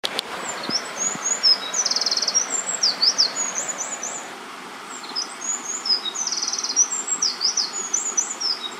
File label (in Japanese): misosazai